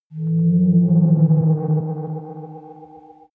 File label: whale calling